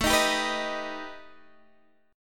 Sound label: B Augmented 7th A